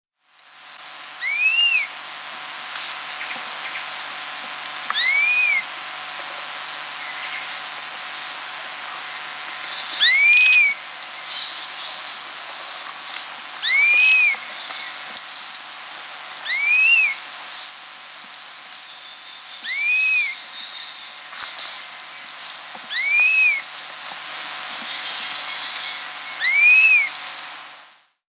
Chestnut-capped Puffbird (Bucco macrodactylus), Peru; S. America